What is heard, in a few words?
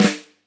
K1 close Flam, drum